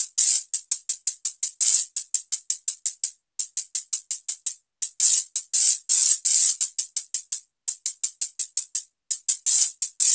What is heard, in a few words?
Anabeat Hat; Sonic Foundry (Sony Creative Software); Prototechno